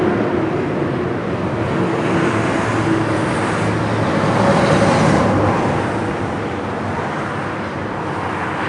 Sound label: HIGHWAY, TRAFFIC